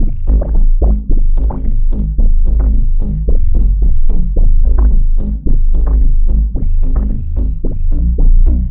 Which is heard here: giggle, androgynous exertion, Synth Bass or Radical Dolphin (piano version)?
Synth Bass